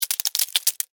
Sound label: Insect Walk Move, Animal, Insect, Walk, Movement, Bug